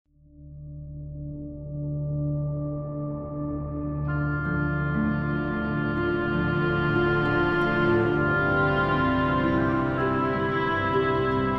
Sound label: Strategy Ambience; Master of Orion